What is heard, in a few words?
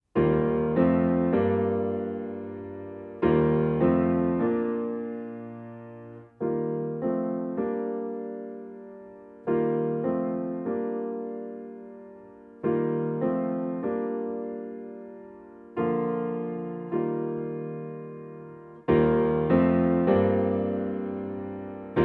piano solo